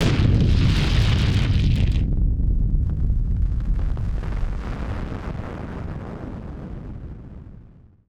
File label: Firework FX; musicradar; bomb factory